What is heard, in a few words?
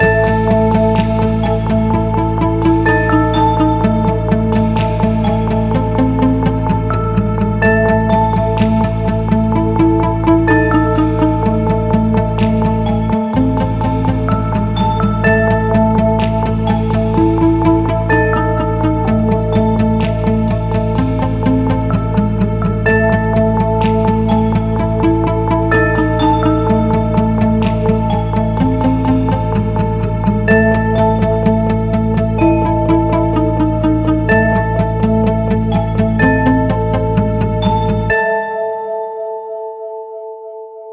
tension project; By Burak Sound Bank; tension gerilim vol 18